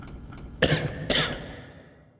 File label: cough